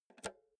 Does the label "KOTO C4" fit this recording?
No